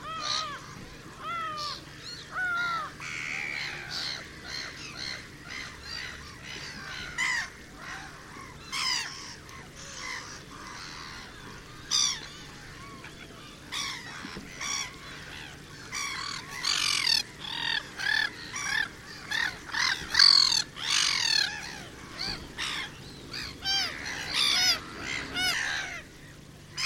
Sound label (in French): mouette rieuse; chant